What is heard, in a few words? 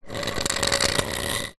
metal pole solid drag scrape concrete; foley; zapsplat